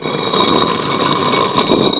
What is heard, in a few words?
stone